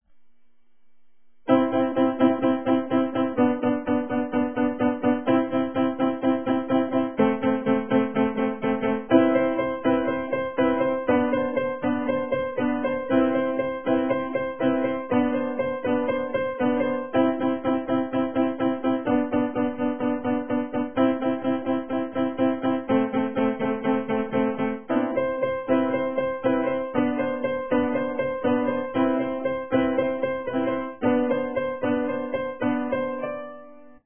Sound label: keyboard